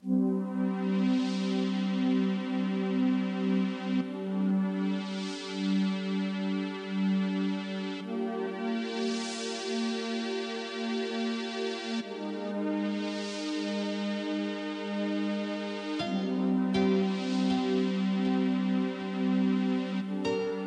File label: ambient meditation AMBIENT DREAM